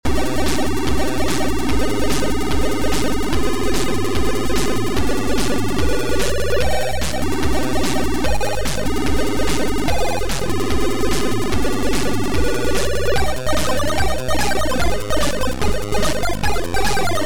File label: Crash